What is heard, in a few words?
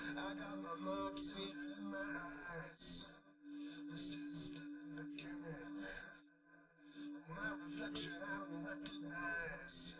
drums live rec 250ms 1m; SiSEC11; underdetermined